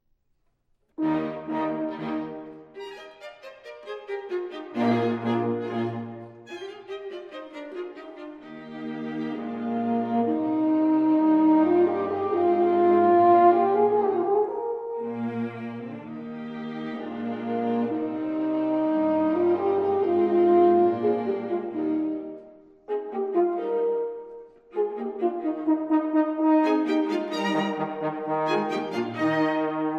Quintet For Horn, Violin, 2 Violas and Cello in E-Flat Allegro